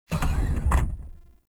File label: Elevator Door Open; Warframe; Caliber Chicks; minigame